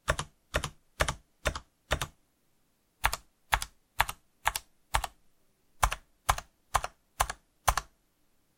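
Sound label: Space Backspace Enter, keyboard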